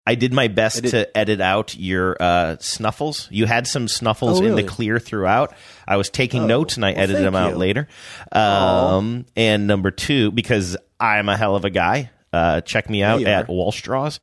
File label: edited out sniffles